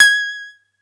atari 520st Gs6

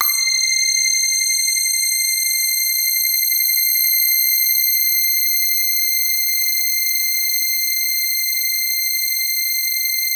house bass C8

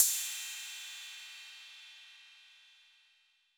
Cym A 808 Sat Decay B, Cymbal, Multi Saturated

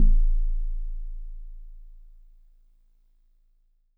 Warble Celeste DX100 A-1

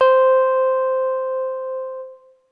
deep C4

